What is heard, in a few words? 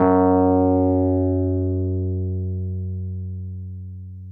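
Wurlitzer electric piano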